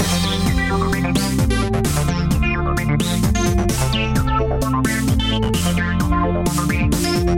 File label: menu; Future Technology